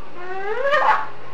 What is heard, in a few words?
big kiss, parrot